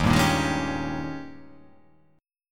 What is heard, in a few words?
D sharp 11th